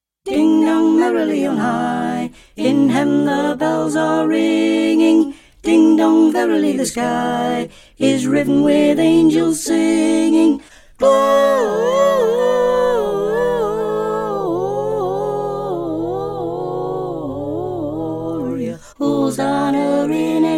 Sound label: Mezzo; Ding Dong Merrily On High; Choir Harmonies; Carols